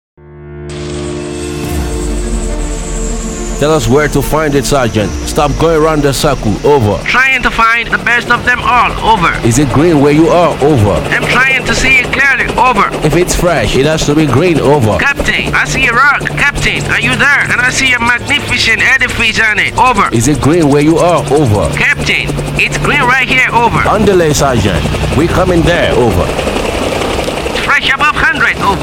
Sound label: Jingles